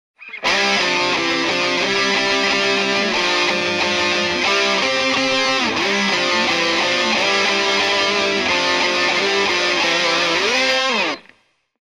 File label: Timbre Rock 3 Guitars